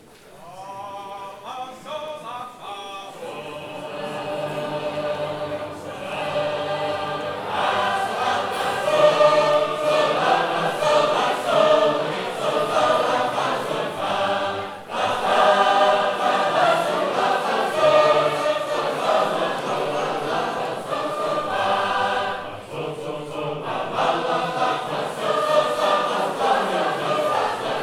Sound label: Huntington, sacred harp